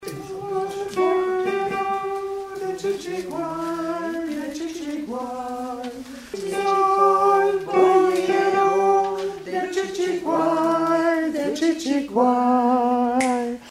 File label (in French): ALTO Couplet; chants